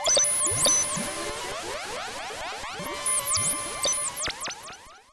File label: FM warble